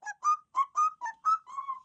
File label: flute; fowl-play; enemies; timmy